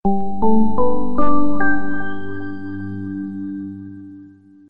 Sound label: startup sms